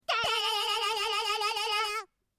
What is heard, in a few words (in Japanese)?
電話の着信音; 電話的鈴聲; 声マネキング; 声マネキング対決; フブみこさん; ホロライブ; さくらみこ